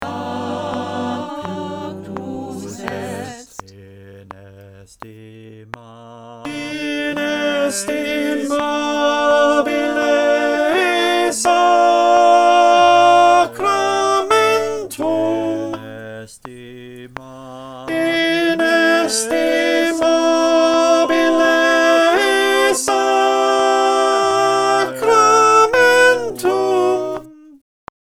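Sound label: alto click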